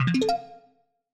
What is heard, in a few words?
stream started